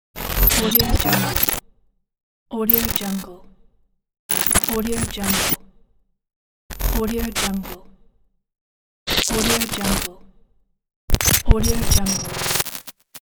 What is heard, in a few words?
cinematic introductory distortion